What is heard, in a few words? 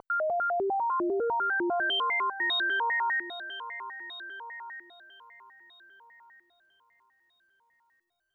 Overlay Tone; Benefactor